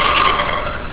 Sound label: secretdoor; openrsc